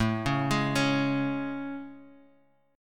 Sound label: A Major 7th A, Guitar, Half Step Down